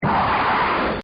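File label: MISSILE, Battlefield; Field Fire; Sound Effects:War; Missle1; Battlefields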